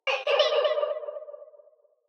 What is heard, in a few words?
Abigail cry